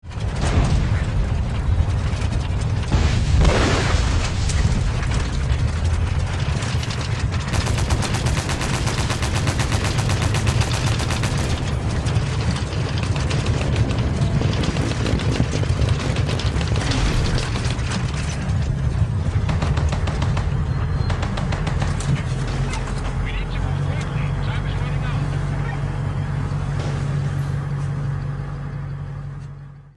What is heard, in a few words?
Military trucks evacuation ambience